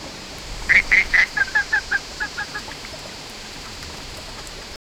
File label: rushing stream gurgling; croak